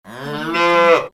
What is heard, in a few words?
calf mooing